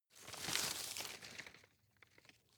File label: rustle medium; foerster